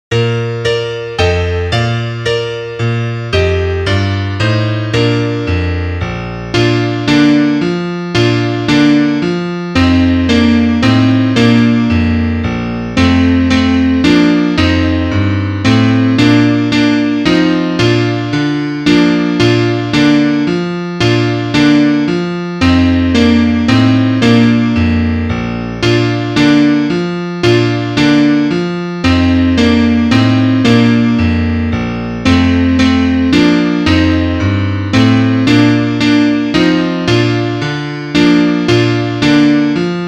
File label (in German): Klavier; Liederbuch; kuckuck